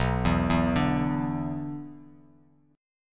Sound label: B-Minor Double Flat 5th B down, Bass Standard; Bmbb5 arpeggiated; JGuitar